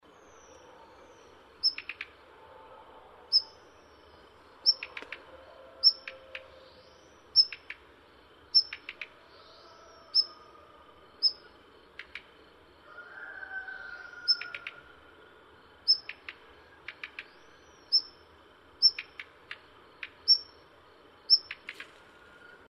黃尾鴝; 北紅尾鴝; 鶲科/冬候鳥

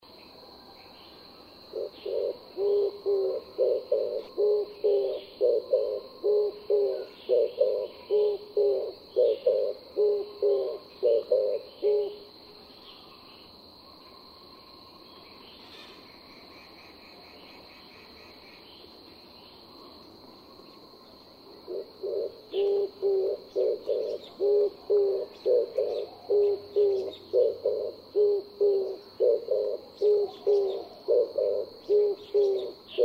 金背鳩; 山斑鳩; 鳩鴿科/留鳥

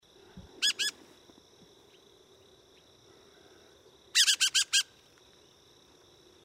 斑點鶇; 鶇科/冬候鳥

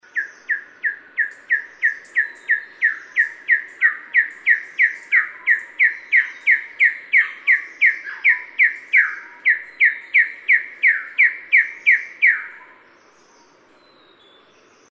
台灣山鷓鴣; 深山竹雞; 雉科/留鳥

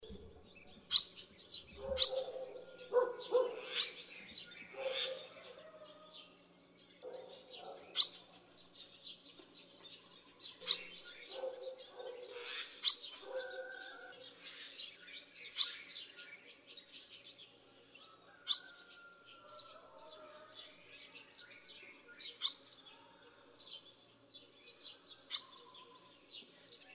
家燕; 燕科/夏候鳥、冬候鳥、過境鳥

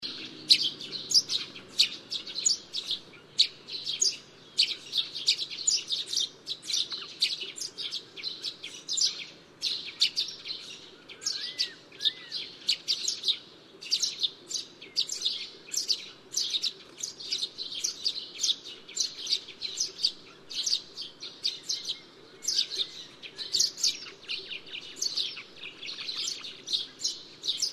麻雀; 樹麻雀; 麻雀科/留鳥

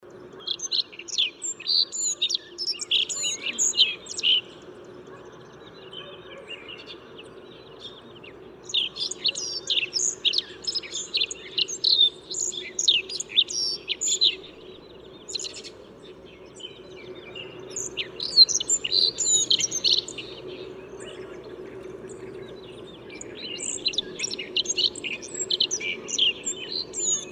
黑臉巫; 黑臉鵐; 灰頭鵐; 鵐科/冬候鳥

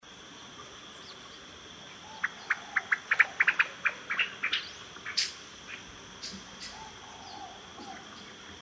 白腹鶇; 鶇科/冬候鳥

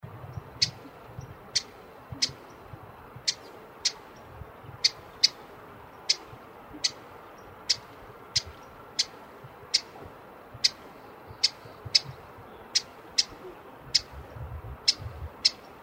台灣叢樹鶯; 台灣短翅鶯、褐色叢樹鶯; 大尾鶯科/留鳥

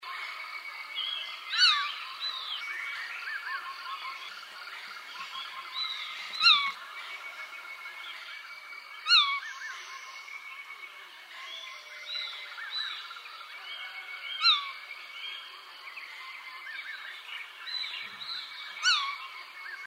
松雀鷹; 台灣松雀鷹; 鷹科/留鳥

